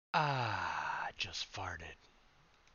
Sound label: farted